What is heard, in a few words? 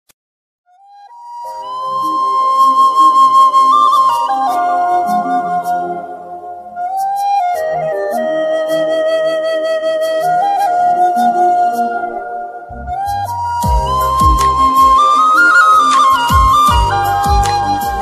romantic ringtone